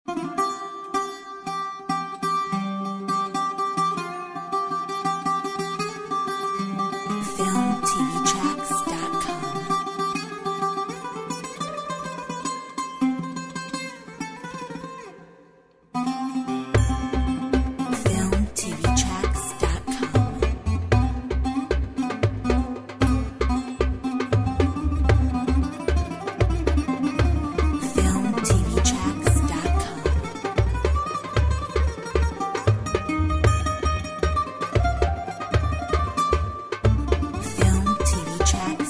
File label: Greek